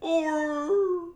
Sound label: argh